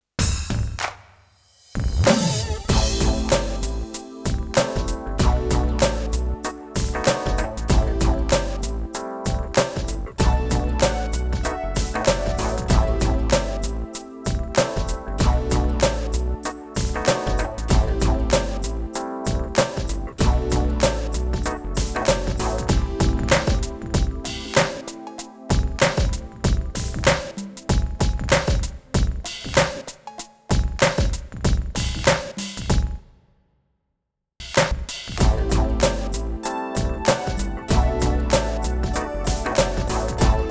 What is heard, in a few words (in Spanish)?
Rap medieval Base musical